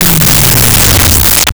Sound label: Low Descend, Science Fiction